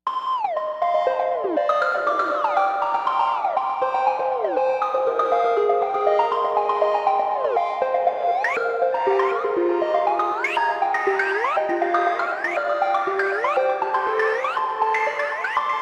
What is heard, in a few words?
air mallet